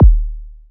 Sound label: perfect bass drum sound d sharp key; kick drum